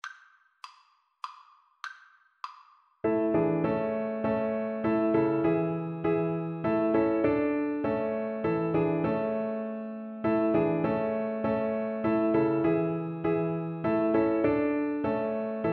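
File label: viola karaoke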